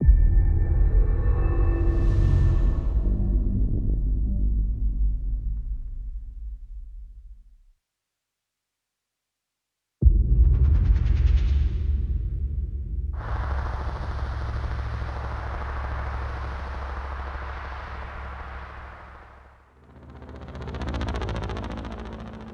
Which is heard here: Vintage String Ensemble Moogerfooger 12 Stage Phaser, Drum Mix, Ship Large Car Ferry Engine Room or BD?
Drum Mix